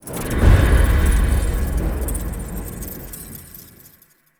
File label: Teleport In Stinger; Tau Prime Bosses; Volt Prime Boss; Warframes